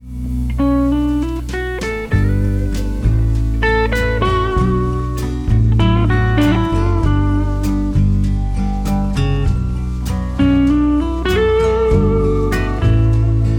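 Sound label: Gibson 59, guitars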